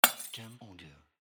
Breaking Glass

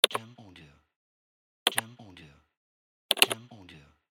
Keyboard Typing

